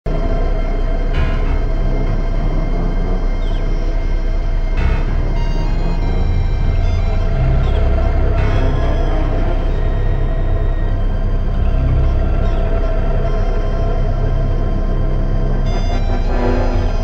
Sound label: Catacombs; Hydro Thunder; N64